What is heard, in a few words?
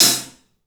HAT, CLUBHAT, 3D Drums; 3D Sound